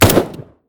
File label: burst; weapons